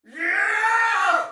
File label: Battle Cry, Soldier Male; Sinecure